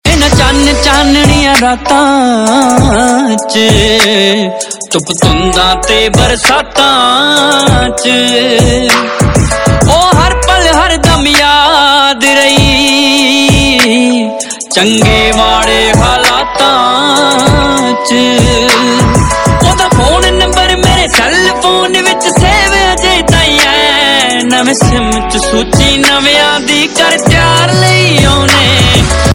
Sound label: Ringtone, Punjabi